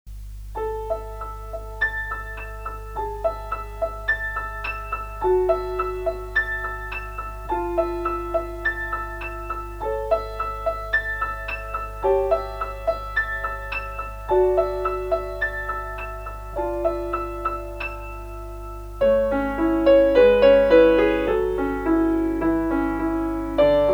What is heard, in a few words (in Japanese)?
ピアノ; 卒業証書; 国分寺市⽴ 第五中学校 2年 B2 課外部門 歌曲以外; 優秀賞（課外部門）; 第56回 東京都中学校 音楽創作コンクール